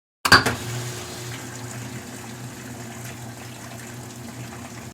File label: washing machine start